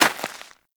Rodent Hop Step Rear Dirt, Footsteps; Forest Rodent; Foley; Warframe; Characters

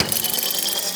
Heavy Grenade Launcher Reload; Warframe; Archwing

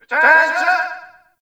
Ambient Campsite Yells; Warframe; Tau

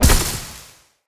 Casting Device Block Impact; Weapons; Player

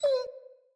Wolf Cub Trapped Call Close

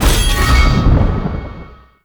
Void Dragon Glaive Pickup; Enemies; Gameplay; Teshin; Warframe; Duviri

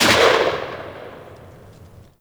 Uzi Tail; Warframe; Tenno

